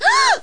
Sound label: gasp; Aeka